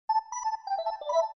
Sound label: joyful notification; короткие, на уведомление, на смс, зарубежные